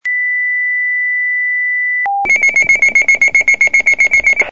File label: Highland pager